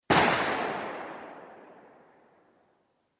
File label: starting gun